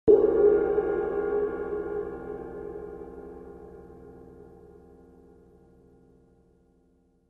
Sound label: K Constantinople Suspended, cymbal